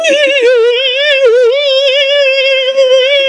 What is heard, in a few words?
woiiiiiooooiiiii